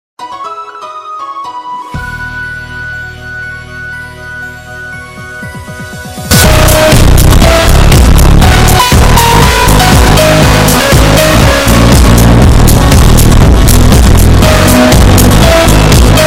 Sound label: music bass boosted; wii sports; Voicemod